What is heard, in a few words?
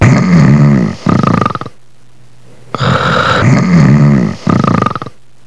SNORE; psion cd vol1